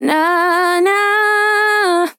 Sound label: VOCAL Fills 120bpm Fm, na nahh